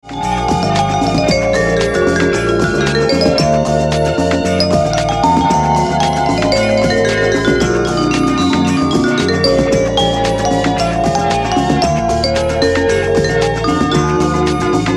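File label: instrumental feat Vibraphone version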